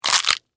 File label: Crunch Celery